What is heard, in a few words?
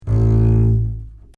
double bass; orchestration